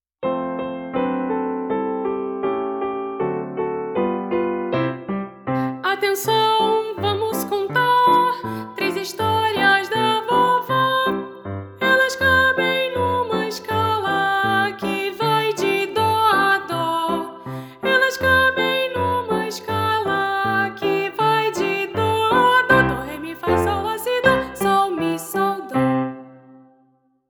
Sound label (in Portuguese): VOZ GUIA; Abertura